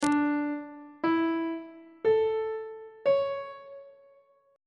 c double sharp major 7th suspended 2nd chord intervals; on treble clef